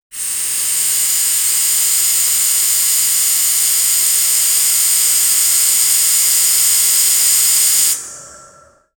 industrial, sound-effects

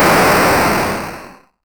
nokia 1100 retro pixel; fireworks

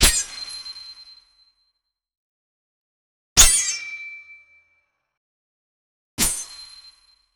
sharp sword slash through; action-packed

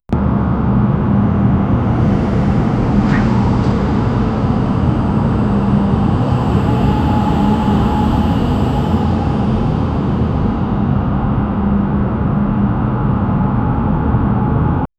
the futuristic sound of c, city noise

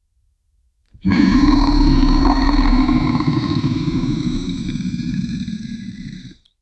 venom hiss growl, panther

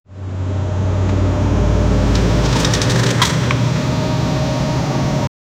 moving platform

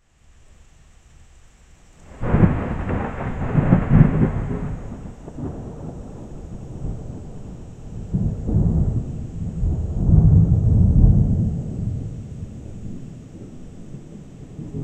storm

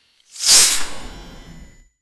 the sound of a sword

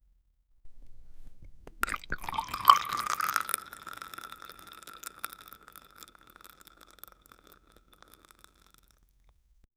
an orange bursting, fruit

